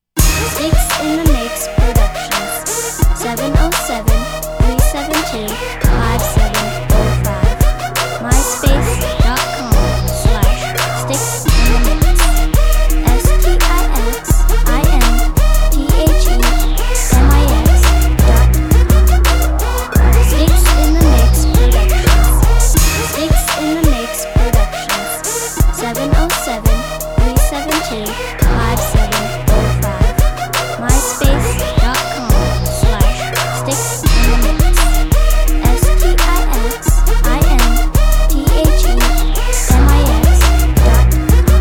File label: a taste of bass